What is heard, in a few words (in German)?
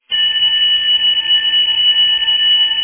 longines kurz, armbandwecker